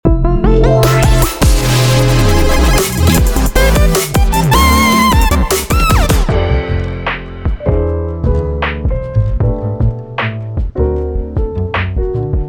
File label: alt b13